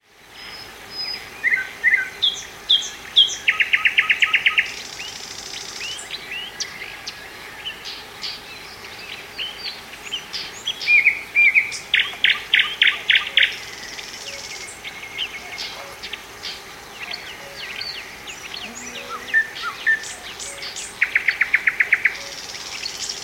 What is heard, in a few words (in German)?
sprosser